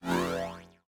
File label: projectile; Smite; god Janus